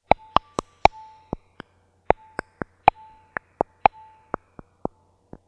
Poppier Beat, Electronic Beats; Sonic Foundry (Sony Creative Software); Myths of Technology

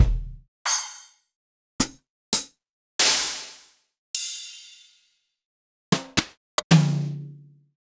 Roland Juno-D Brush Kit

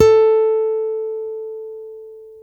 FLY STEEL, GUITARS; Spectrasonics; Hans Zimmer Guitars VOL-1; PARKER STEEL

